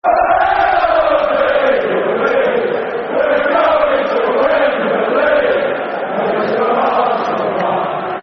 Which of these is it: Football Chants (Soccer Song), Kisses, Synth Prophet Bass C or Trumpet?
Football Chants (Soccer Song)